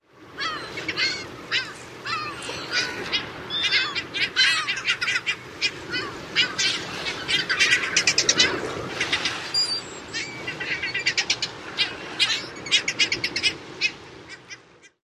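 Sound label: typical calls, Heermann's gull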